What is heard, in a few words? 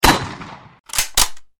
A Rifle Gun Shot